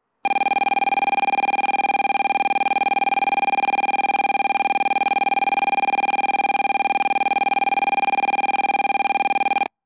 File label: bell sanyo, st announce; kobe sannomiya st; hankyu